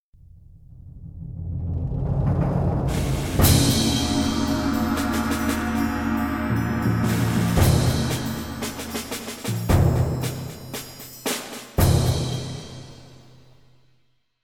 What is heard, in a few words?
Orch Per All